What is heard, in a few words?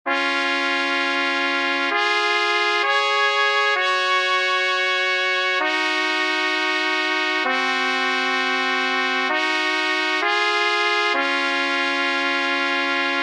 DUET TRUMPET Ave Maria